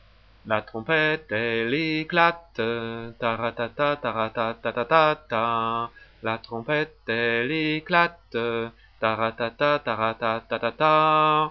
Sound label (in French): trompette